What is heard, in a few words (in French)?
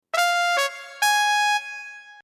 succès